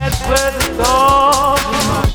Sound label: Chorus Break 4 Beats